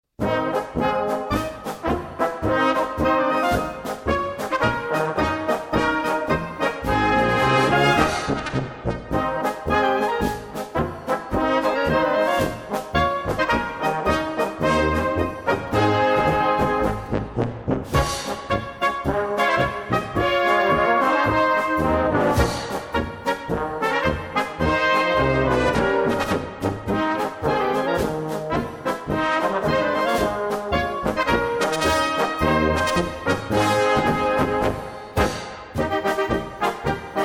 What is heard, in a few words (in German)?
Polka